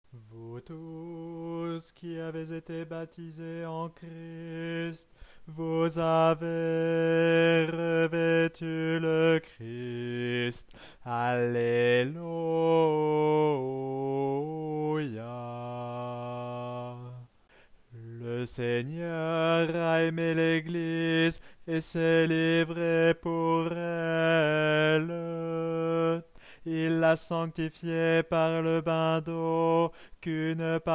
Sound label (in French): Alto; Vous tous qui avez été baptisés; attention, pas sur le même ton que la partition et les autres enregistrements